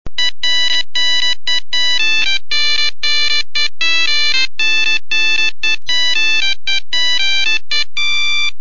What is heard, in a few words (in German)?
Polka